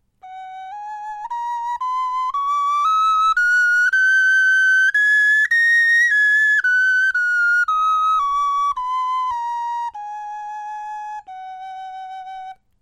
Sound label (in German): tonleiter, knochenfloeten